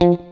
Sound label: STRAT, GUITARS; Synclavier Sampler Library